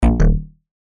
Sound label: multimedia notification error buzzy fat